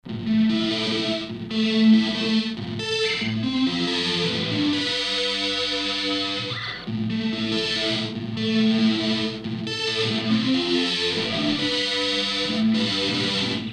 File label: guitar, ambient